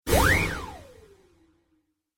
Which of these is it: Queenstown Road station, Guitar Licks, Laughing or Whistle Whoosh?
Whistle Whoosh